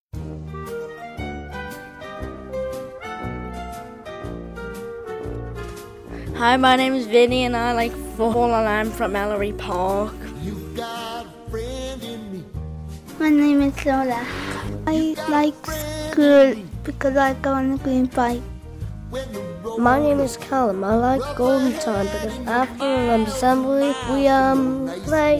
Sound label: jingle; Elleray Park School